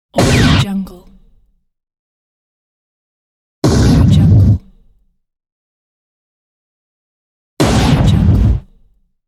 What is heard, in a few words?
gun shot sound effects; wistansound